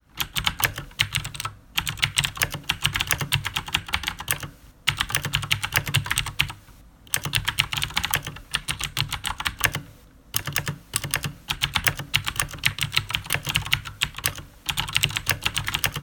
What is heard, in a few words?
CHERRY MX BLUE